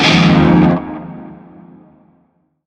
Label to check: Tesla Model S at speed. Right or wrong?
Wrong